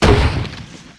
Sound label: grenadelauncher fire, weapons; Tribes2